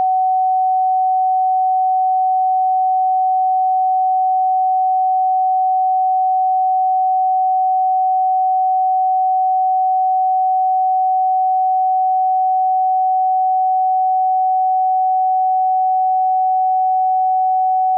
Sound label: 750hz sine; new sine tests